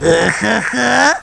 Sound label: uhuhu